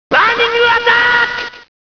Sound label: burning attack